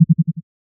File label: smash; noteye; roguelike